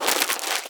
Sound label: card discard